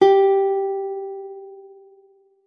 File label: G4, guitar; Xsample VOL-2